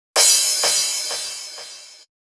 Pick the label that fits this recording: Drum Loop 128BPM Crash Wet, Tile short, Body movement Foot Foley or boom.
Drum Loop 128BPM Crash Wet